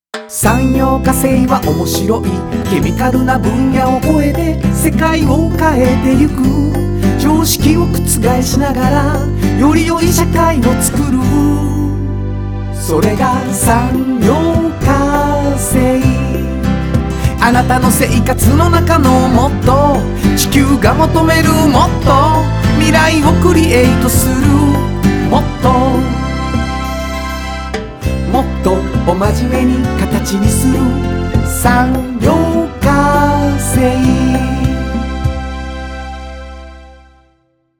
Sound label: sound logo long